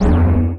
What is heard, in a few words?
Shield Generator Hit